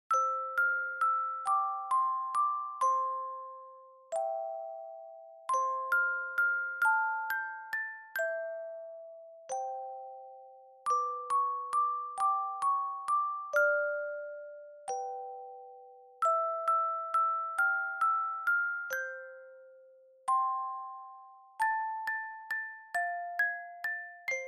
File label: Dry Fast; Lullaby; Mozart